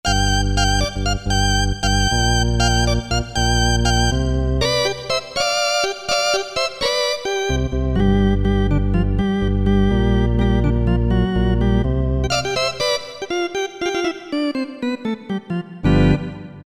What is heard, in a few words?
60s Organ, Roland SR-JV80-01 Pop